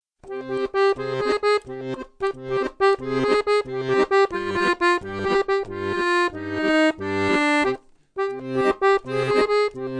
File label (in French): midis brume; france